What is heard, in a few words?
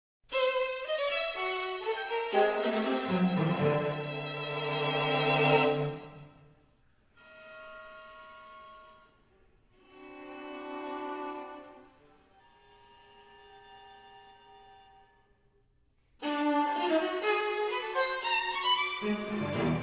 String Quartet; String Quartet was completed in 1995. The premiere performance was December 12, 1997 in Rome, Italy by the Santa Cecilia String Quartet